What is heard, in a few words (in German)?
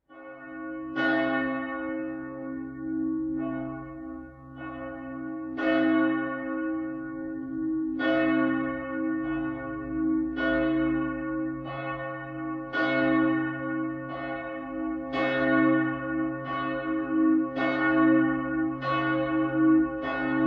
Glocke cis, Domglocken; Petrus